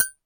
impact metal small; sound effects one

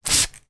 household water spray bottle single mist spray; sound effects one

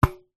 sport tennis ball bounce on racket; zapsplat

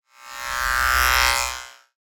Whoosh Shaver Pass By; Just Whoosh 3; soundbits

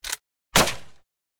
gun cock then shoot

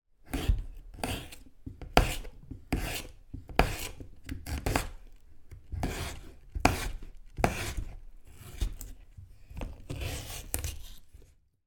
potato chopping slicing with knife

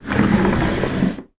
office chair roll across carpet floor; sound effects one

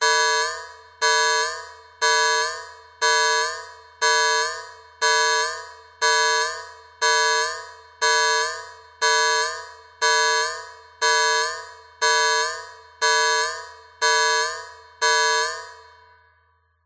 science fiction alarm siren warning danger reverb

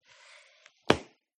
Book, Close, Paper; PMSFX

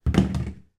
toy small rubber toy drop on hard floor internal

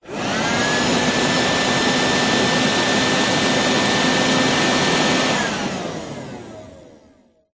vacuum hand held small burst off

